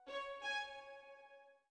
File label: orbit violin; Sky Children of the Light gamerip